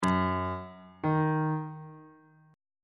relative minor of g flat major is e flat on bass clef